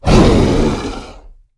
roar; smite; god sobek

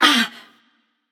grunt hit passion; chaac

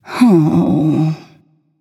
sigh; smite; nuwa

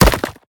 hit; smite; geb

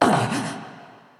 surprised; buffed; smite; nike

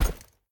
mvt footstep; smite; gilgamesh

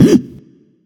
notice; danzaburou

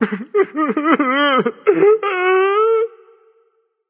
boohoo; smite; arthur

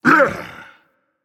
Grunt Jump Dragon; fafnir

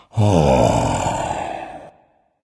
content dragon; fafnir

